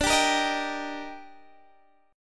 D sharp Major D, chord; D#/D; strummed; chord shape

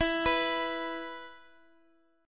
E 5th, Guitar, Standard; arpeggiated; chord; x,x,x,x,5,7

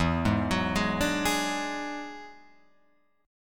E 9th E down Guitar Open F; E9 arpeggiated; JGuitar

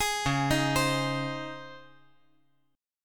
Db Major 7th Suspended 2nd Db; 13,13,11,15; DbM7sus2 arpeggiated; JGuitar

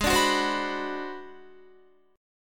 Ab 7th Sharp 9th Flat 5th Guitar, chord; Ab7#9b5 strummed; JGuitar

